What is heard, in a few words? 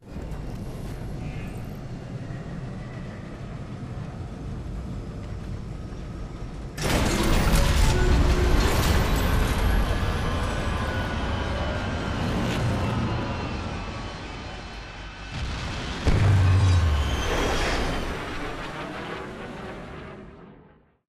fly in ship; commander; gates; Titanfall 2